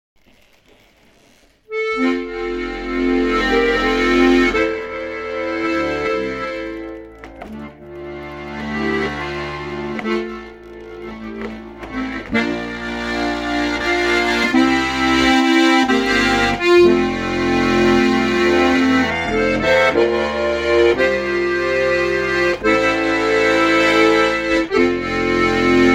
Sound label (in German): Akkordeon; Ziachorgl